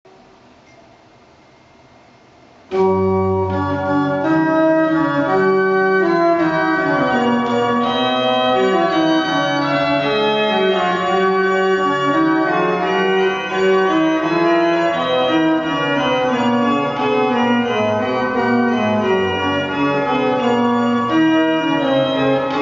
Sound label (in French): Duo orgue; MARIN MERSENNE; harmonie universelle; Duo du 9ème mode; Charles RAQUET; Neufchateau